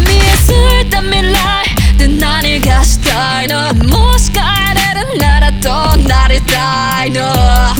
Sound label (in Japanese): ハモリ有り